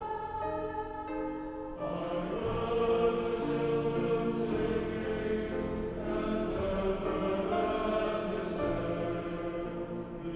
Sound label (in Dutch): koor, orgel, vleugel